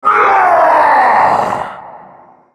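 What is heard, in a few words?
ultimate effort; GatekeeperGalio; Character Voices - English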